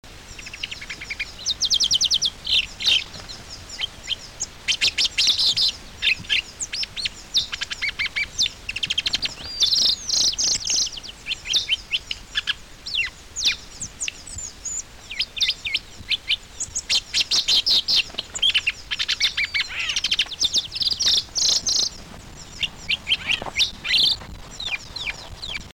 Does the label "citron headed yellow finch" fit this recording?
Yes